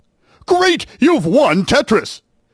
Game Win Game; kids-tetris

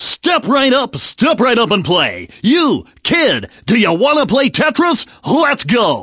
Game Start Circus; kids-tetris

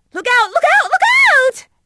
Close To Lose; kids-tetris

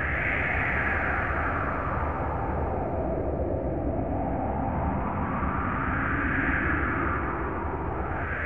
wind; deltarune

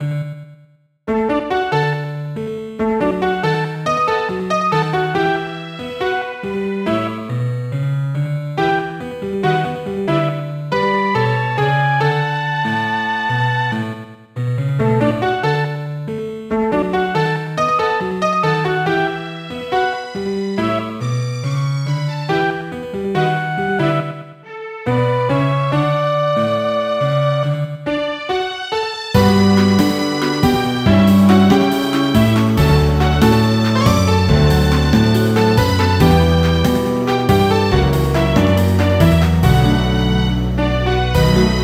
thrash machine; deltarune

